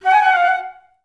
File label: FL F#4; Best Service; Peter Siedlaczek's Advanced Orchestra